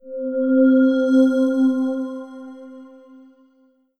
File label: Roland JV-2080 NextFrontier C4